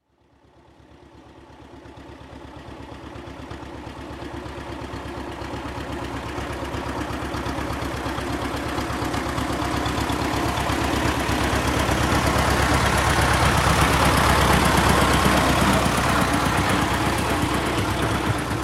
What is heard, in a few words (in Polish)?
Traktor